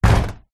impact metal corrugated loose

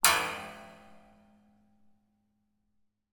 impact metal strike spring large industrial

